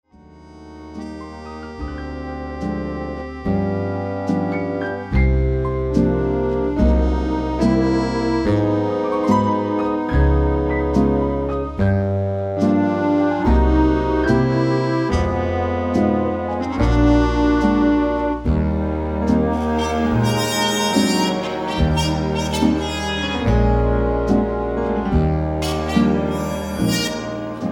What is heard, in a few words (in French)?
instrumentale; Cotton Club movie version